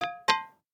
Stone Hit; Samsung; Galaxy A56

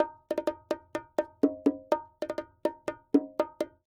Bongo Rithm; NGM WeMove Miracle

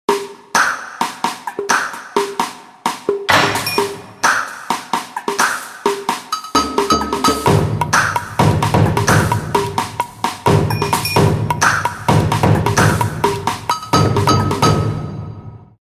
Cuisine, ringtones

